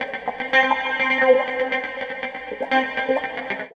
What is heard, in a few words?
gtr